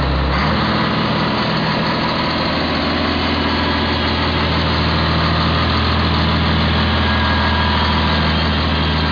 paving

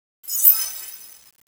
magic; minecraft2

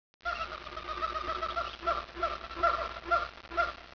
JUNGLE

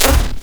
jumper; frankenrats

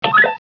Key Press

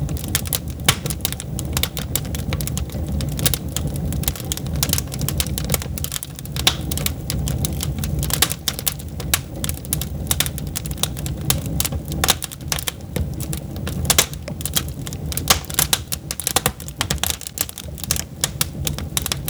fireplace; toyshop

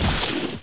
plop

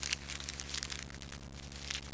paper

